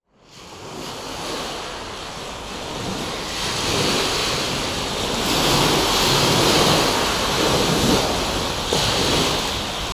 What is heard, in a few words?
waves azimuth 45 spread 180 incoherent